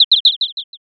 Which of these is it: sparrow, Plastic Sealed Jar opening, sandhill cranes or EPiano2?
sparrow